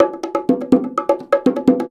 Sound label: Percussion Loops, Bongo & Conga; Houseworx